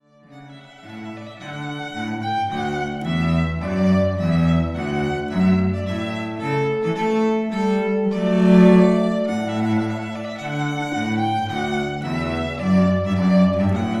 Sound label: Trumpet Voluntary Duo, string duet